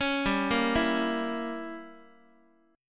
G Diminished 7th Guitar, Gdim7 chord; arpeggiated; JGuitar

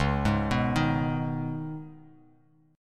Db 7th Suspended 2nd, Bass Standard; JGuitar

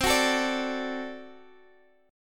D Major C, guitar

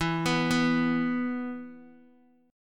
E 5th, Guitar; arpeggiated; JGuitar

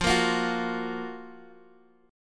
Gb Major 7th F, guitar

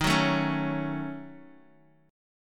D sharp Minor 7th Flat 5th, Guitar, Open D; x,6,4,3,4,x; chordshape

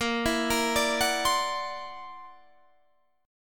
C 9th Flat 5th A sharp

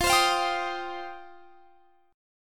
F 7th Suspended 2nd F down; F7sus2; strummed

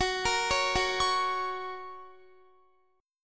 Gb Major Gb down Guitar; Listen to Gb arpeggiated

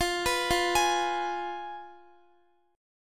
F Diminished Guitar; arpeggiated

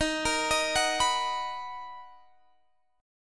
B Major 7th Eb

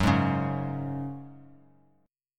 F sharp Suspended 2nd; strummed; JGuitar

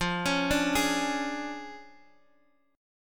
D Minor Major 7th Flat 5th F, guitar

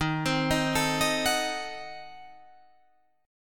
Eb Diminished 7th Eb, Guitar Drop D

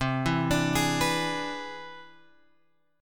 C Augmented Major 9th, Guitar, Open F; arpeggiated; JGuitar

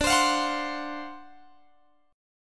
D 7th Sharp 9th, Guitar Open G

